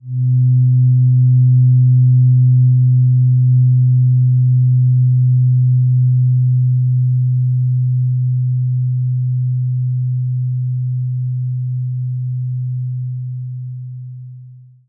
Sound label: fork Mid